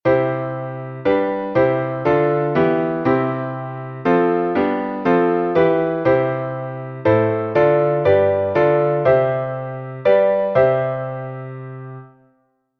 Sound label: primary secondary triads; Essentials of Music Theory: Elementary